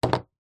technology headphones large closed back down on table